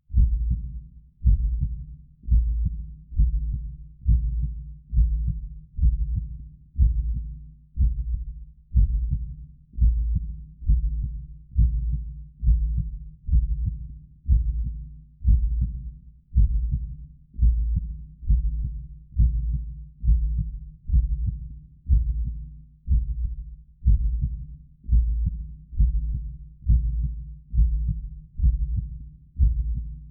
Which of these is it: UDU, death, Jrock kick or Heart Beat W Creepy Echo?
Heart Beat W Creepy Echo